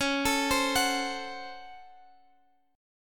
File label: Db Major 7th Suspended 4th Sharp 5th, Guitar Open G; DbM7sus4#5; arpeggiated